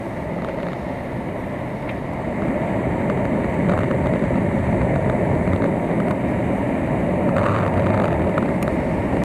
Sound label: Skimmer Seam With Poolscope